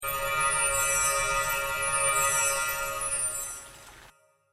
Loading Bar; DailyChallengesPopup

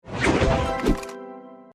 Target Zoom; DailyChallengesPopup

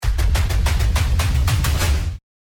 Daily bonus impact; WheelBonus

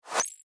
Pop Up Entry

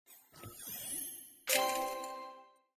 Piggy Win

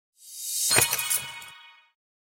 Lock Anim

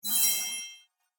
Glare Anim

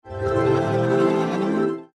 Curtain SFX; CurtainRaiser

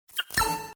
Widget Entry; DailyBonus

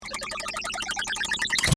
Scroll; RSLeaderboard

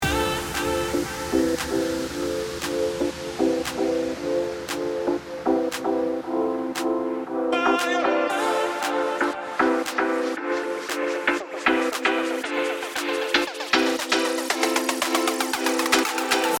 Loading BGM; MainLobby

